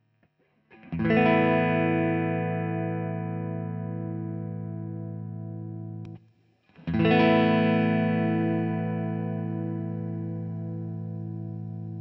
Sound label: JHS Prestige Buffer